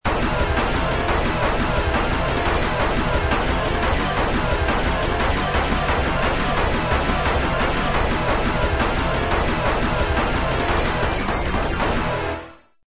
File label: another unfinished riff